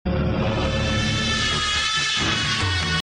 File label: BG Flute Sms Tone; dharti ka veer yoddha prithviraj chauhan